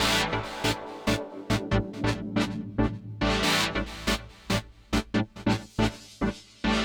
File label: SPACE SYNTH 140 A; Keys; SpaceSounds